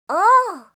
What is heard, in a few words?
ooo, voice reaction